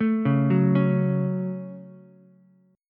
C sharp Suspended 4th Sharp 5th C sharp Ukulele, chord; arpeggiated